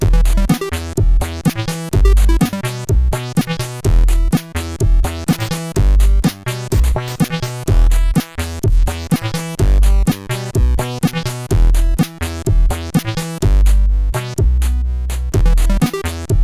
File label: Ringaround, CSG8580R5; sid